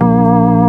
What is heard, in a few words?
HOUSY, RAVE; Rubber Chicken Software Sample Bank